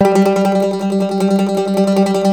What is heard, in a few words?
MANDOLIN, Tremol Mandolin; StTremolMandolin